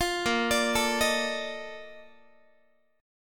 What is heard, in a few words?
Bb Major 11th Bb Guitar; arpeggiated